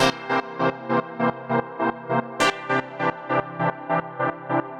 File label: Pad 100 C, sidechained